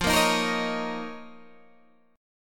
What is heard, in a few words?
F 7th Suspended 4th Sharp 5th F, Guitar, DADGAD